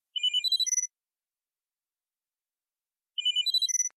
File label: Ring; Marissa Cooper